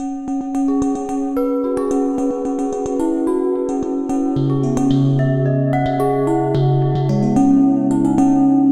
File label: Bowls - F#min 110 bpm; MIDI Clips; Tonal; Motifs; Adventure Bowls; Ableton; Factory Packs; Mood Reel